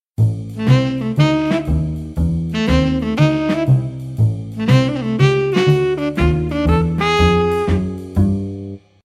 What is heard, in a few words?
Sax; Vodafone; Smart-Mini